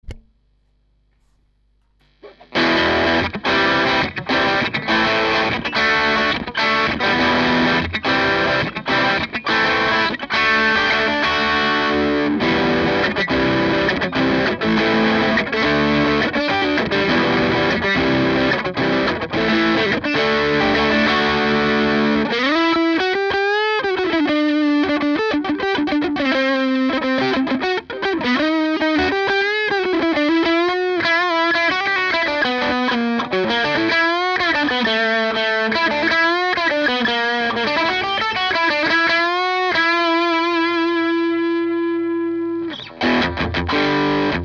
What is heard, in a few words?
FENDER ST43